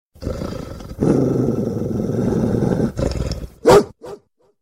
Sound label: Angry Barking Dog